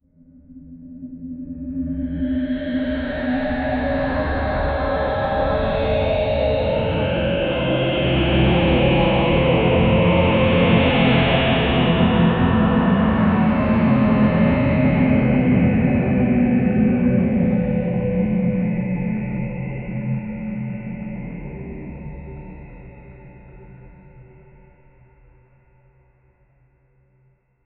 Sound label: ethereal ambient layers